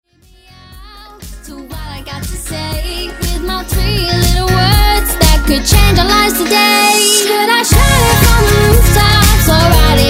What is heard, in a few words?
120 bpm